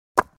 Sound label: close